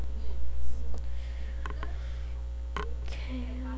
cow

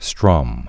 strum

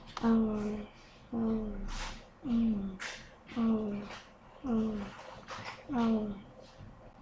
owl

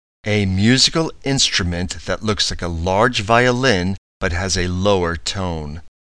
cello

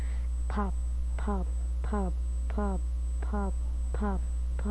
pop

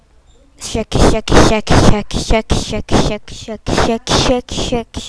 shake

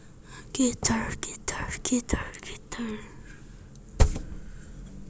guitar

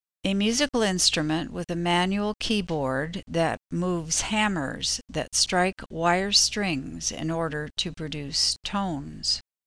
piano

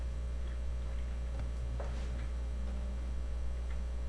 squirrel

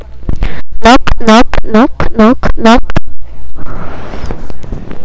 knock

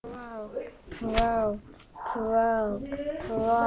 frog

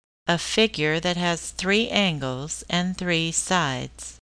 triangle

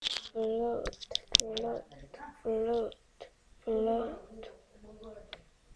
flute

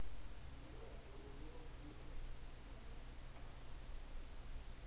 strike

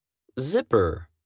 zipper

